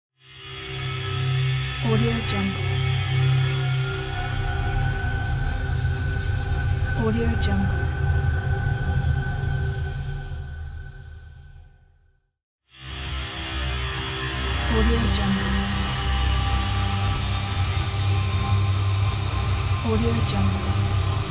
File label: glitch sample series